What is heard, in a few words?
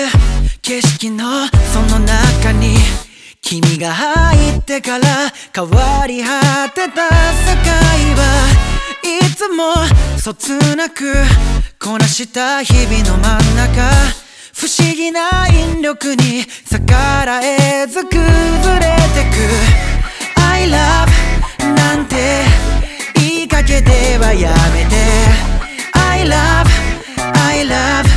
English Song Ringtone